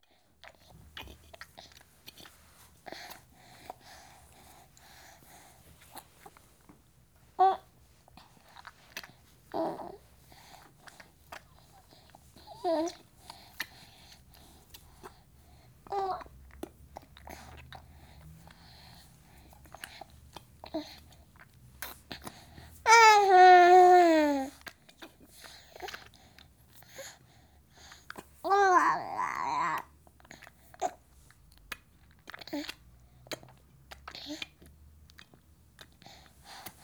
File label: Month Old Girl, Drinking From Bea, Babies; BBC Sound Effects Library